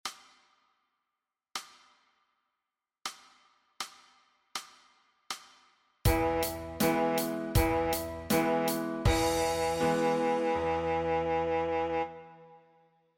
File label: sax D flat